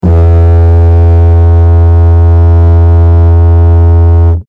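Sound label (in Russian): диджериду одиночный длинный звук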